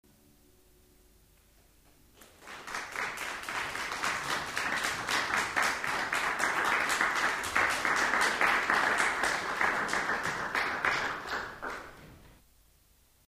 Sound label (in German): Applaus mittel; Menschen